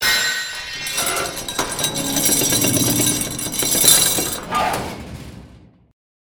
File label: pipe grill hit open